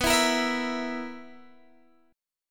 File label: B Major 7th Suspended 4th B down Guitar DADGAD